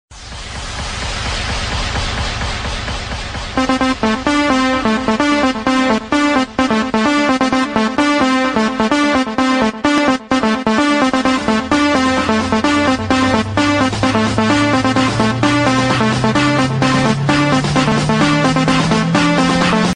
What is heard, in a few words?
banging mix ringtone